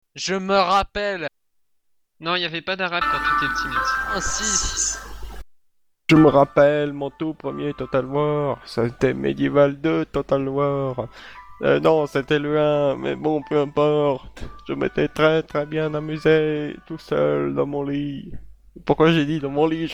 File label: emo